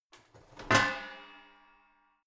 garbage can open lid hit wall

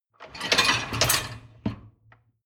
dishwasher lower tray draw in short

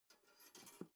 toaster pull down soft

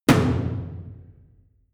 drum hit simple small

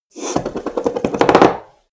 cup spin

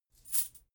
chain mail armor movement

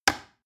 plastic button little push heavy

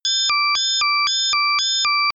sci fi alarm small personal beep

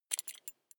metal belt buckle clatter regular

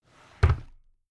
drawer cabinet carton close heavy halfway

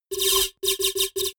typewriter sequence

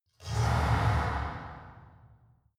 mechanic steam

